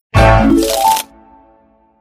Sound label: card unlocked; Garfield's Diner; Android game music